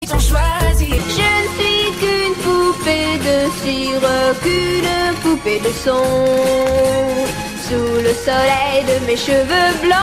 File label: best french ringtone